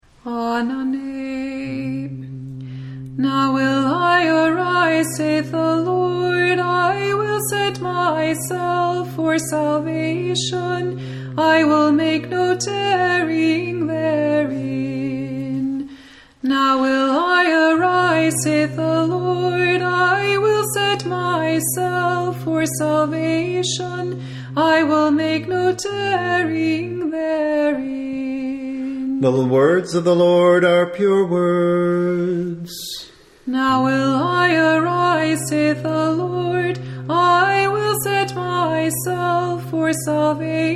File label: Sunday Orthros Prokeminon tone 1, chant